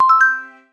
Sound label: startup